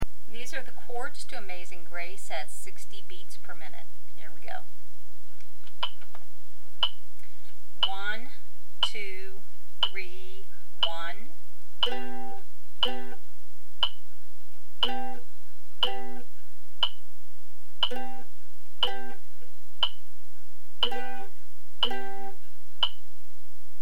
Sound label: Chords 60bpm, mandolin